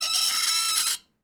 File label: Texture Metal Glass Scratch; MatiasMacSD; FEEL TEXTURES VOL 1